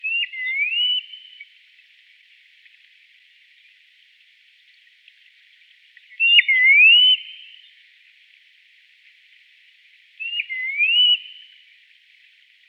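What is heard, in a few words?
American golden plover voice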